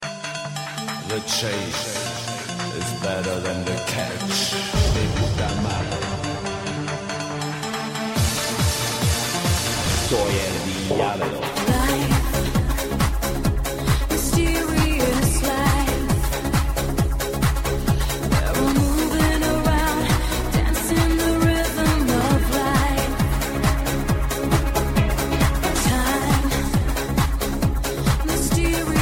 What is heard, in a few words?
Euro Dance Mix